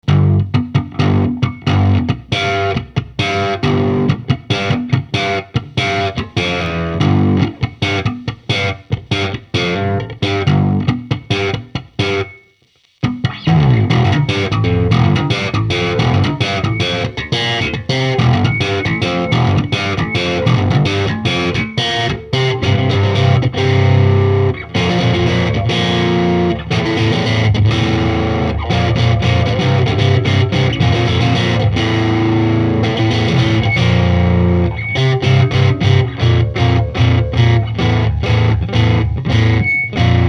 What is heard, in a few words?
hiwatt